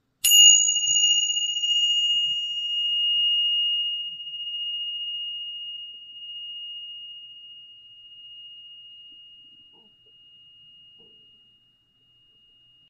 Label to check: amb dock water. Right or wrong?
Wrong